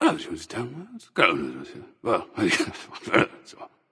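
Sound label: nonverbal grumble; smite; voicepack; merlin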